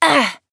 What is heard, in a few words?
pain; Valorant; raze